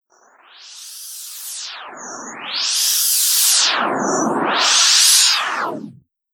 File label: Undulating Gulp Whoosh; Whoosh Sound Effects